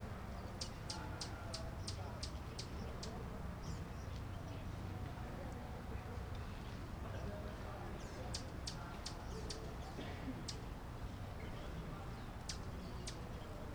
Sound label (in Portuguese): Ambiencia unb area externa com passaros, pessoas e transito longe; universidade de brasilia